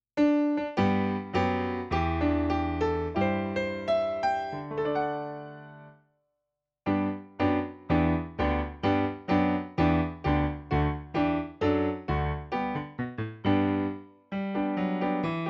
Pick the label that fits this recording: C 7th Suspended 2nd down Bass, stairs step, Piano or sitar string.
Piano